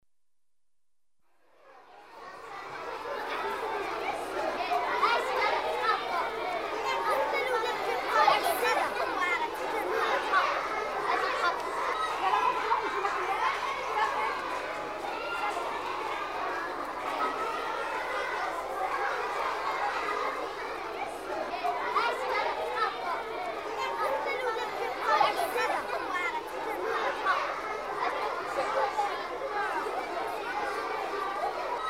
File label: Morocco- schoolchildren, Rabat, singing and playground games; The BBC Sound Effects Library; Africa- The Human World